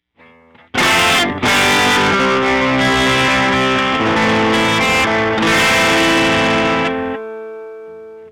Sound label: Conga Fuzz; Moogerfooger MF-109S